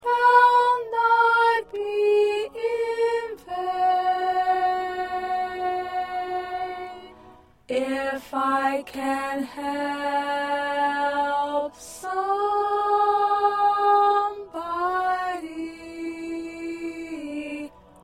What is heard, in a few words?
1st Alto